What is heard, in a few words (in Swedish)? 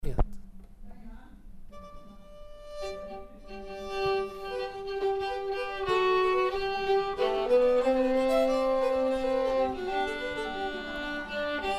Polska, 2a-stamma; Polska efter Byss-Calle